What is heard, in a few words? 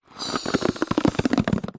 foley balloon inflate short single breath expand creak; zapsplat